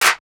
CLAP

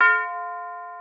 Southside Stabz, FX

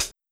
Closed Hat

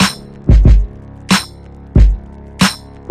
drumz

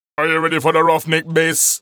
128 Ruffneck Bass; Vox

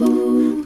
Owooo

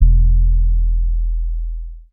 808 - E; Cymatics

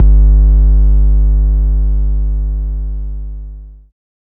KNOCK 808; BWB UPGRADE3

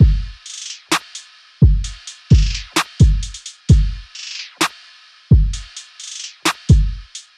Drum Loop 130 BPM

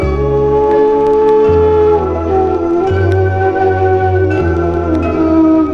Indonesia ORKES MELAYU

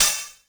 HO, Open Hats

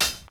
Hat, Closed Hats

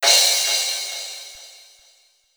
Percs

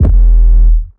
Droid 808

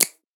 Snap; Percs

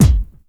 BEAT, Kicks

